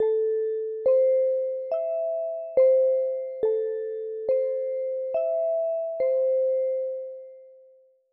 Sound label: horror sky Music; sprunki incredibox